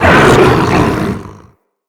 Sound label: Random Gutteral, Infested Quadruped; Characters